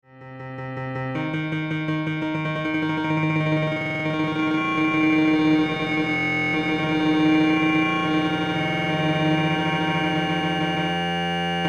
from 20bpm to 1000

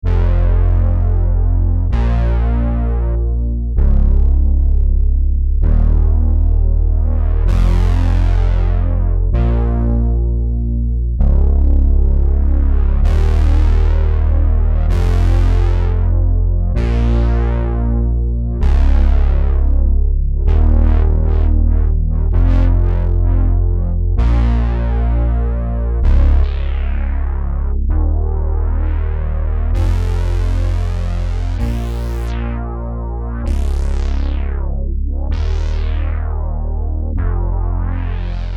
Speaker Rattling Filtered Saw Octaves

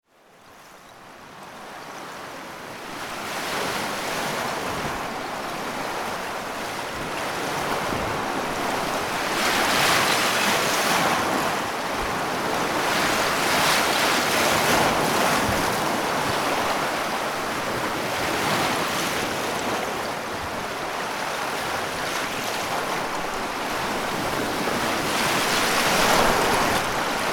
waves of ice

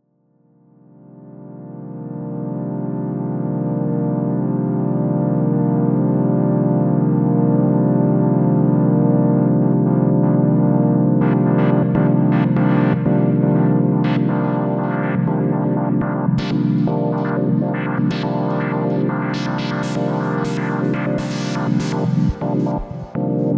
Random LFO

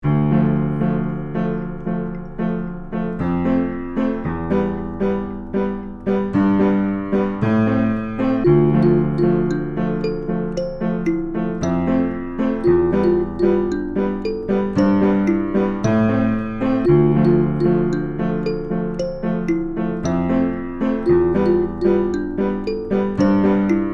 piano kalimba

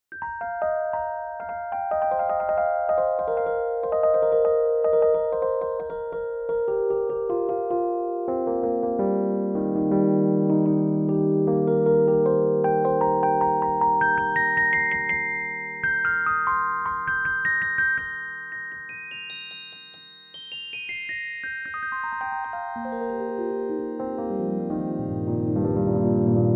pentatonic scale

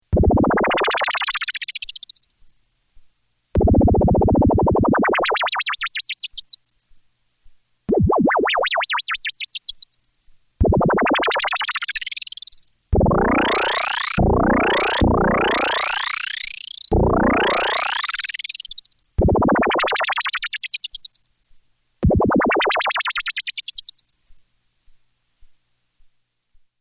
Resonant Wobble

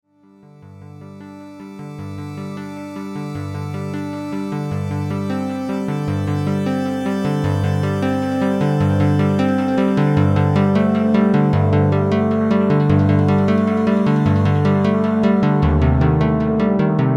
Synthesized Sho

